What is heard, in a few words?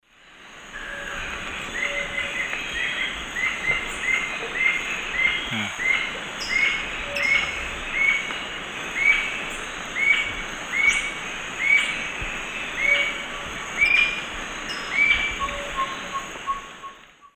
Galbula chalcothorax, call; S. America; Eastern Lowlands